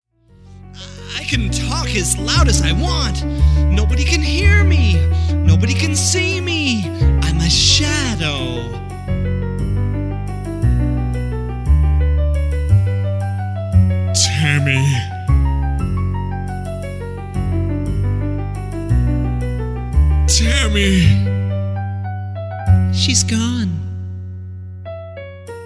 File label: Melodramatic Silent Movie Music Underscore